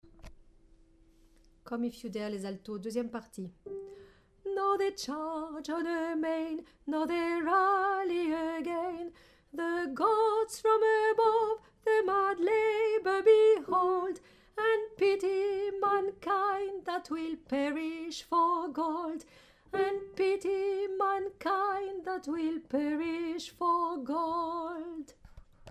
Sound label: Alto